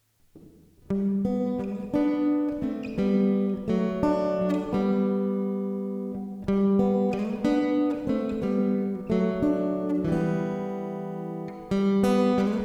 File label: Harley Benton TravelMate E Reverb